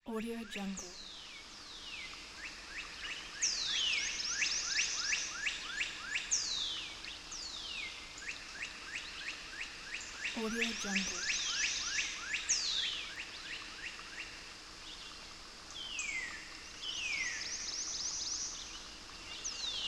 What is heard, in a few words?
nature ambient